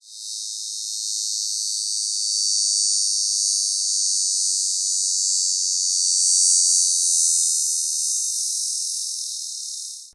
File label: Neotibicen davisi, cicadas songs; US; AL